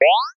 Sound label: multimedia game sound classic retro jump